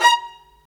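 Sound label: Marcato, Strings; Vlas Marcato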